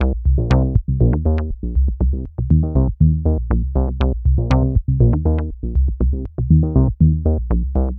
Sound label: Bassline, Prototechno; Sonic Foundry (Sony Creative Software); Basses